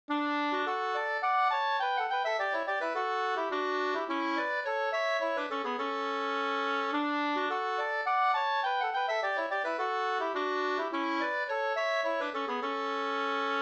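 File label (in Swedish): polska, tvåstämmig; JAd Hagg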